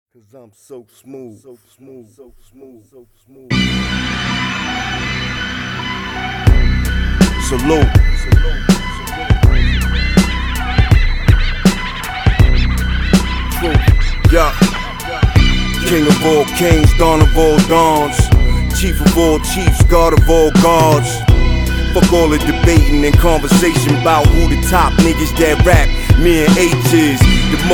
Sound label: Gunfire, US Hip Hop